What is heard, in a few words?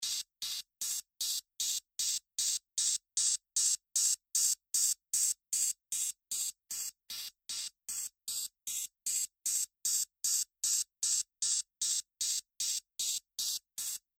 HiHat